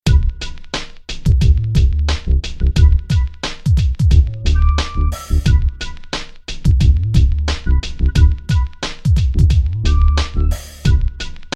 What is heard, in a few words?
Roland MC909 hiphop